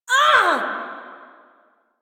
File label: Pain Lich B